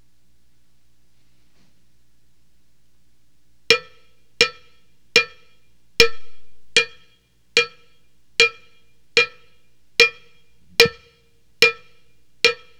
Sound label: COW BELL